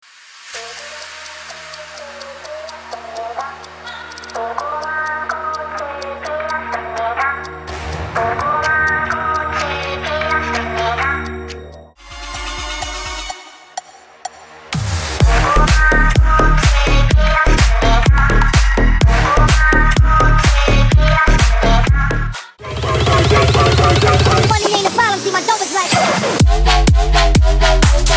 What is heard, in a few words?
nonstop bass house